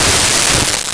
Bat Ram, Weapons and Mass Destruction

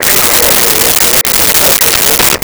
Police Radio Chatter; Communications

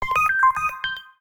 Pure, notifications; BLU; Studio-5.5-S

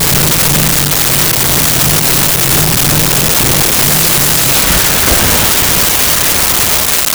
pulse; Sound Effects Library (28Cds)

